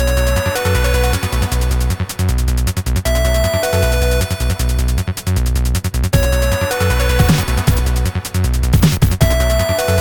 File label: 2a03 funk